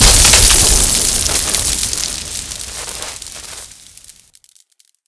disintegration; fx disintegration

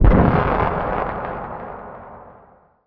explosion grenade emp low

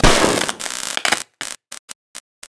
fx explosion robot bzzzt

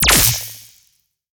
Pistol Alien Blaster Fire NPC Layer A

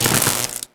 explosion robot buzz

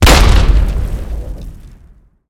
explosion grenade frag high

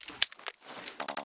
WPN Pistol Alien Blaster Reload Bolt Close

